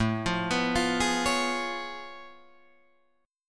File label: A Augmented Major 9th A down Guitar Drop D